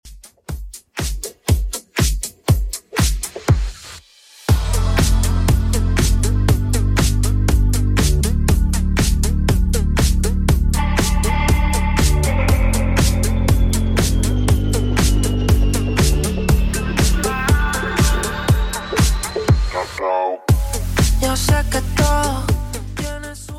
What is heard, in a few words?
Top40 Redrum 120bpm